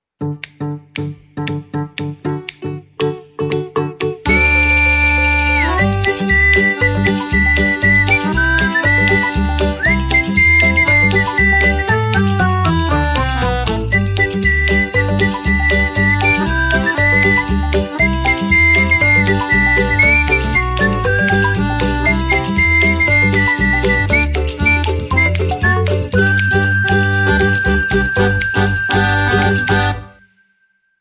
Jingle Bells

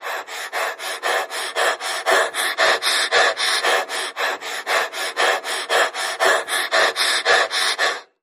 Vocals Fast Breath Pulse

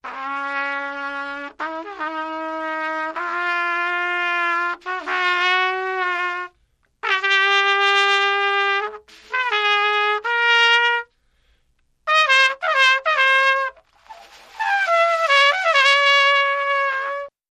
Trumpet Bad Practice Scale No Reverb

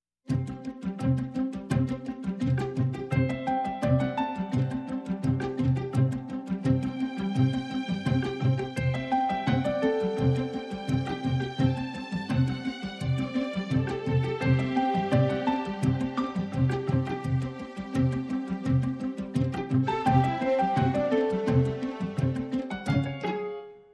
No Perc

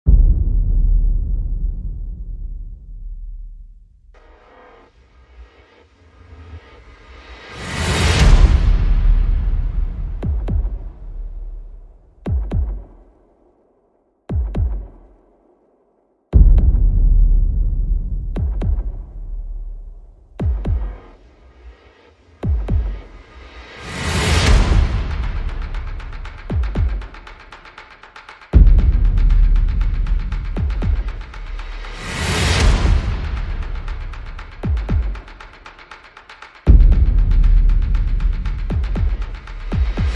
Percussion and FX

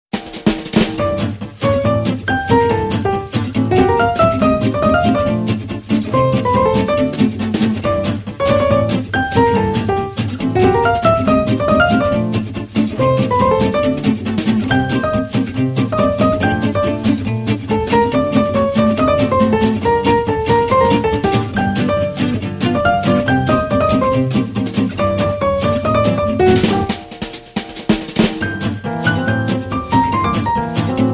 No Bass

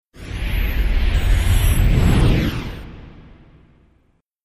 Jet Fly By

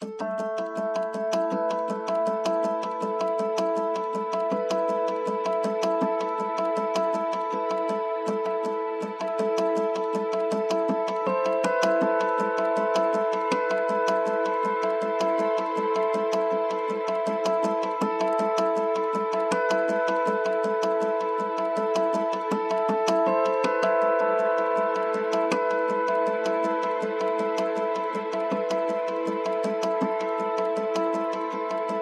Curtains Falling, Keys and SFX Only

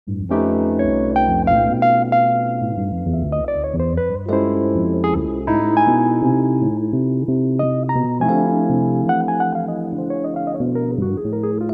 Bass Night

